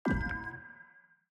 ambience room secret room artifact upgrade ready

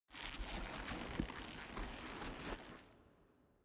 ambience room torture tripalium leather

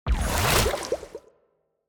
Water Sphere Pick Up; TANK; 3Souls

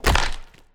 Bone Golem Hit; TANK; 3Souls

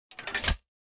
gate down

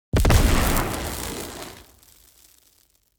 environment zone electro explosion

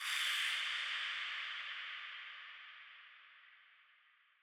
ambience room shadow room scary ambience

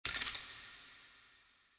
ambience room necromastery bones

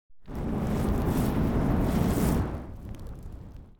ambience room forge working layer

